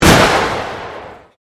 Convolution Pulse; Sound elements to create more complex sound effects; Swell Sound Effects; Swells (Sound Effects:Sound Design)

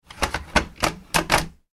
animals cat through flap; Cat Going Through Cat Flap; Cats

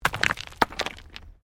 STONES MOVE

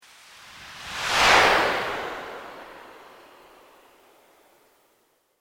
Sweep Organic Slow; Created sweeps, swooshes and other movement effects; Sweepers; Sound Design; SoundEffects-Foundation4

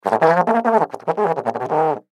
Baritone Fast, Baritone Fast Talking; Cartoon; Musical; Cartoons; Blastwave

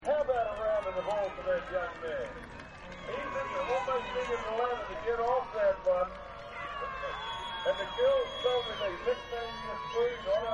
Rodeo Ambience; Rodeos; Backgrounds

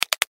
Gear Dial Switch, Gear Dial Switch Multimedia; Web Interactive; Technology; Noise; Blastwave

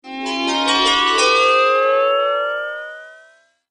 Arcade Game Magic Sound; Video Game Sound Effects; Retro Inspired Sound Effects - Think Nintendo, Atari, C64

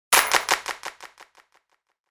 Hit Clap Chime Panning Delay; Production Element Imaging Element Accent Transition; 5.1 STEREO MIX; Blastwave

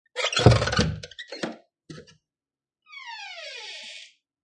Door Open With Rattle Then Creak; Creaky Door Sound Effects; Lynne Music

